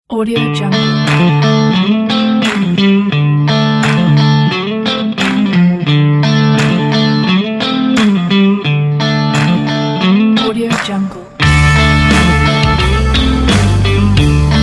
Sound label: western country rock pack